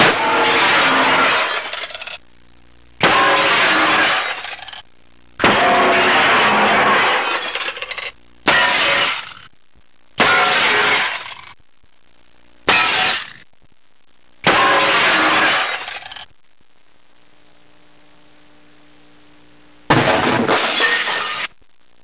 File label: Crashes, Vetri; Effetti sonori